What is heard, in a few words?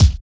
clicky bass drum one shot d key, kick drum one shot tuned to the D note